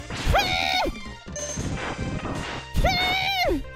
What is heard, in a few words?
HMMM HMMMMMPHH